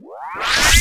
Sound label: Missile PickUp; Pulse Racer